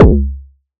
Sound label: Kick It Square; Drum Hits; Kicks; Loopmasters; Jon Carter & Alex Blanco; Block Party Essentials